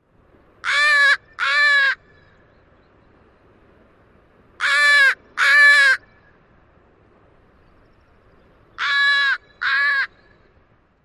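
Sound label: calls, northwestern crow; AK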